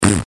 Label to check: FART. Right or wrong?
Right